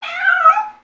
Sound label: Pet Cat Beg; creatures pets; Lego